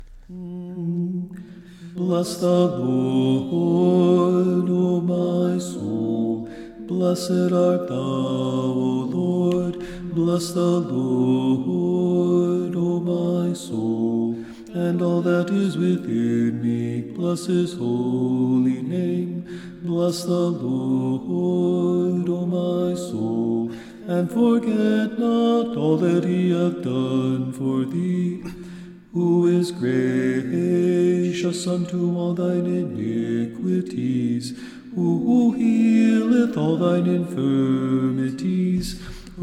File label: Antiphon full bass emphasis